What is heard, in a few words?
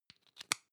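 lipstick close, Bathroom; haus-garten; badezimmer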